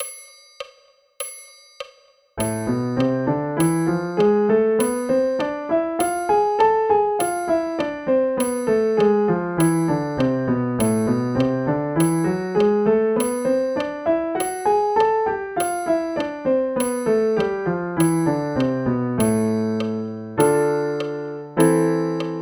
A minor scale and arpeggio; bastien

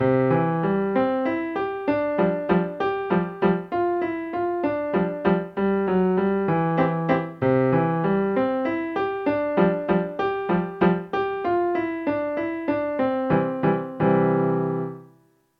waltzing elephants

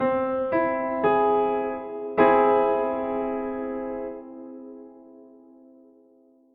c aug sound

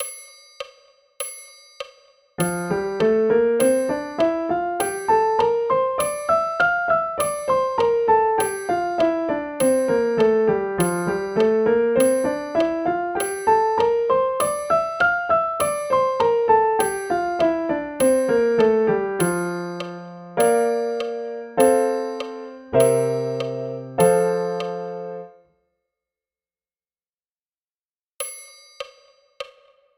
F Major Scale and arpeggio; bastien

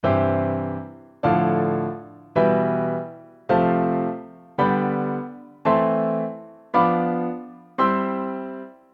C Major diatonic chords 2nd inversion

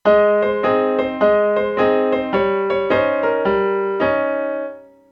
swing

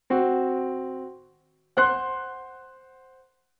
c and f sharp

